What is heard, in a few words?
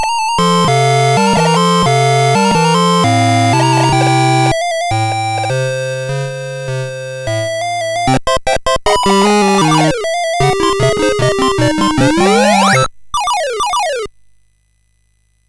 Musicbox, sid MOS6581R4